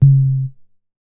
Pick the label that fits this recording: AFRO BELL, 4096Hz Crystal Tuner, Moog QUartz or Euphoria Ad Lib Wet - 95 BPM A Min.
Moog QUartz